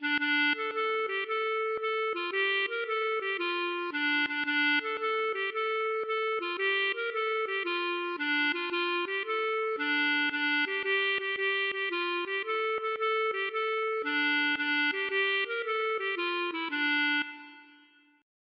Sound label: gavotte; Bas Leon; Nag En Tu All Da Baris